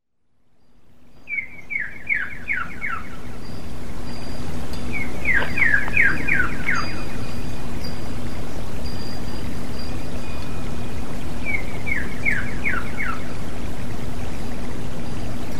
terapi alam samyong betina

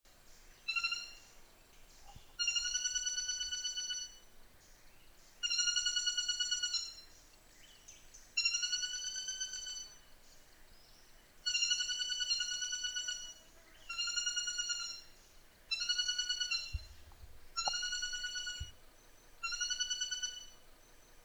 suara pelatuk tunggir emas

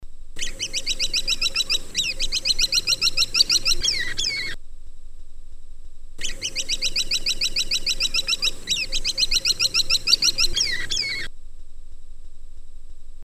suara caladit

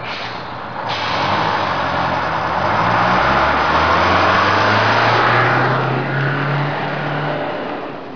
suara mobil

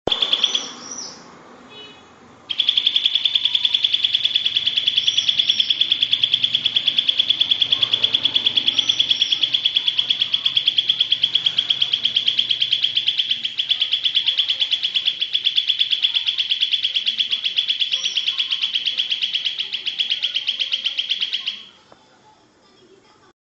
suara burung cucak rante narik

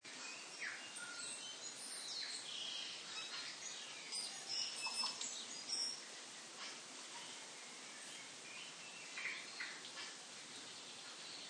suara alam

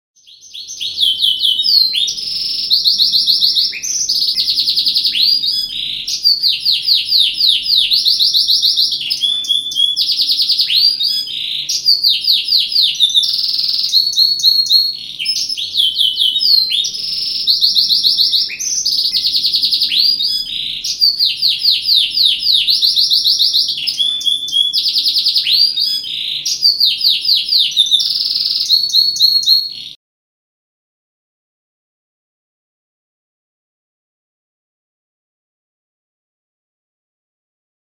kenari gladiator modifikasi

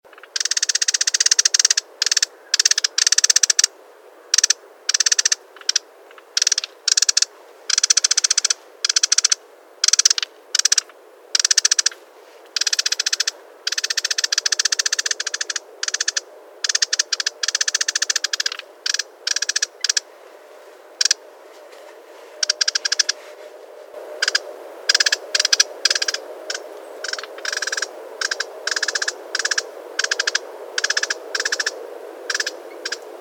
suara panggilan burung wrentit

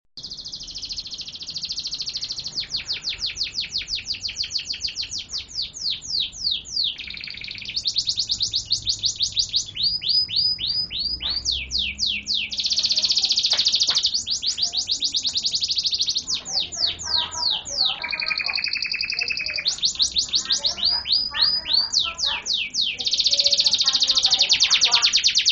audio kenari; kancil

